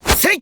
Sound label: kick; bb-kurogane